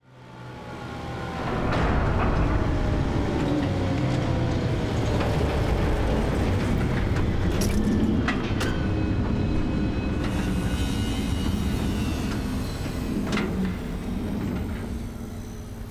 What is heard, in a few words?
ship colossus closing; Stellaris; toxoids; ships